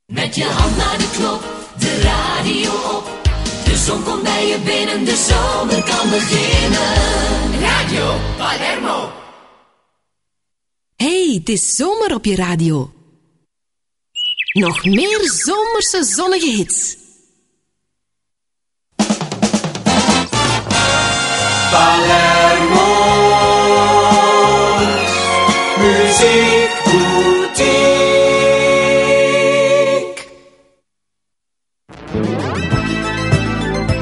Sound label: jingles; Palermo